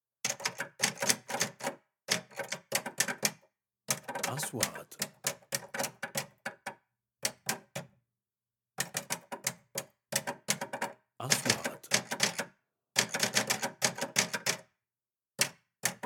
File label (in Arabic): آلة كاتبة قديمة